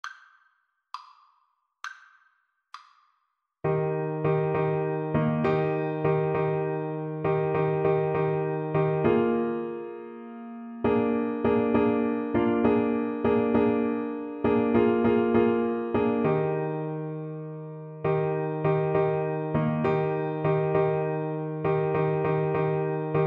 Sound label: Soprano Saxophone